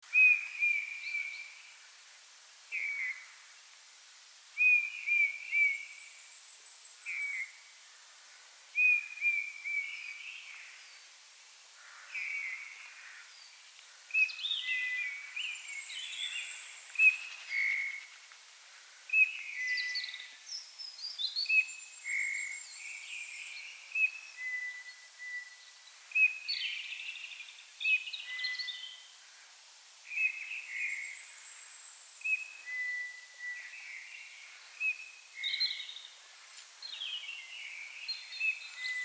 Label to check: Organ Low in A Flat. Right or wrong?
Wrong